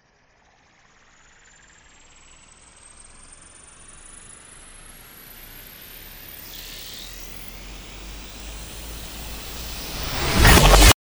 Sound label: build up